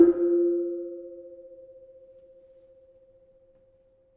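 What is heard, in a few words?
Kenong 4 F, gravity harps tuned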